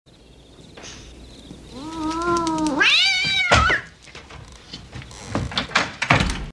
cat meows nervous; animals

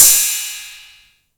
crash sound d sharp key, Crash Cymbal; drum sample; one shot

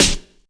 acoustic snare e key

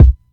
subby bass drum one shot c key

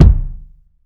strong bottom end kick sample a sharp key; kick drum samples

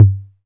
tom drum g key; tom drum samples

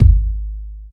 huge kickdrum G sharp key; Mono

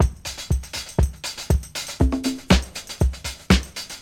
120 bpm high quality drum loop c key

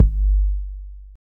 big kickdrum g key

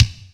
shiny steel kick drum sound G key

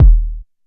reverb kick single hit d sharp key; kick drum samples

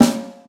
00s full snare single hit a key

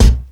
clean kick b key

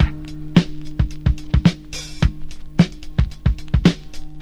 109 bpm fresh drum loop sample g sharp key

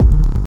Organic Crispy Techno Kick; single hits one shots; kick drum samples

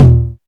tom single hit a sharp key; tom drum

